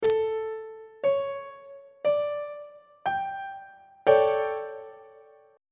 d major 7th suspended 4th 2nd inversion on treble clef